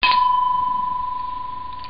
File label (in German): glas